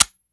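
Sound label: weapon fire first player; gmod; viper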